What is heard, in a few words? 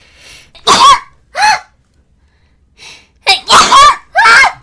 cute sneeze